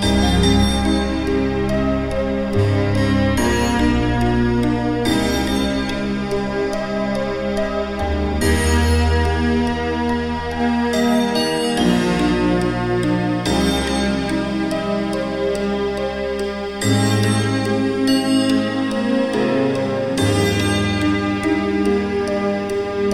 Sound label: Tyger [instrumental]